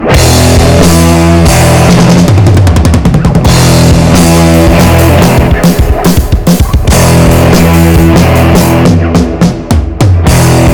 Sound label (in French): sonnerie